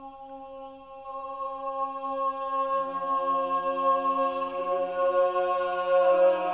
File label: voice; Sad